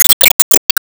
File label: Doubleslash Hit; Disney Star Smash; Android, iOS, Mobile